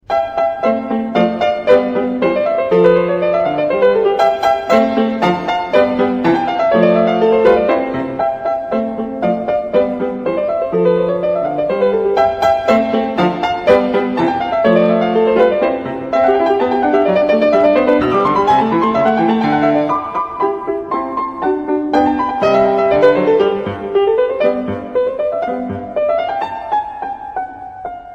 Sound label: Mozart Piano Sonata No 16 in C major